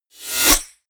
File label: sweep